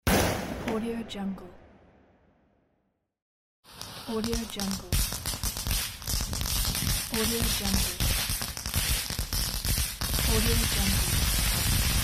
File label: petards